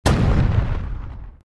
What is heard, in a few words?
Ink Boom